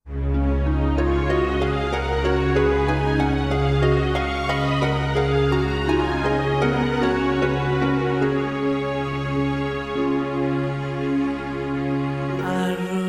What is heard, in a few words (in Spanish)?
Arrorro mi nino; materiales de apoyo a la educacion inicial en iberoamerica